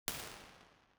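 impulse response, St Patricks church Patrington model; b-format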